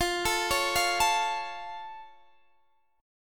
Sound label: F Augmented, Guitar, Open D